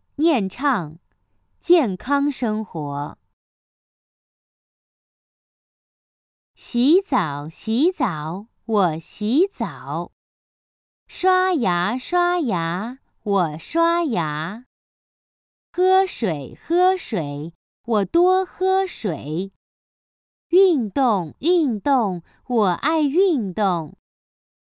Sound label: Chant Healthy Living; Chinese Treasure Chest Volume 3